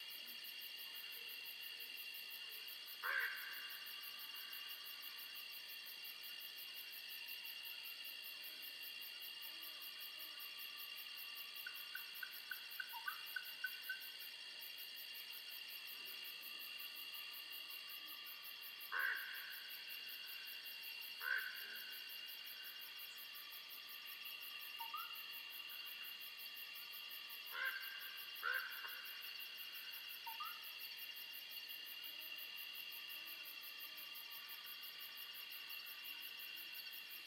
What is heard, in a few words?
Forest